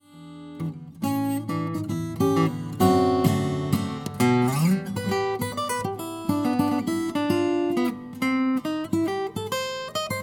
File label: Rag, guitar